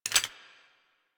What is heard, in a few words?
Flame Spear Reload; Grineer